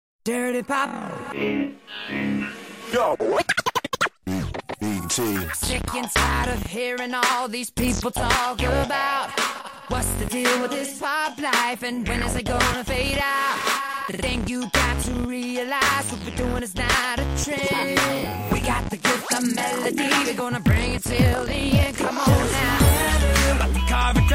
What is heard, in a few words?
Pop